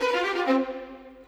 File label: Violins, Orchestral; Sonic Foundry (Sony Creative Software); Orchestral VOL-2; Modern 12